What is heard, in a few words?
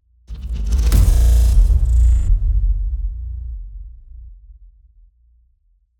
Impacts designed; Just Impacts; soundbits